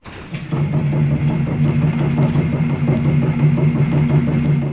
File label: vehicle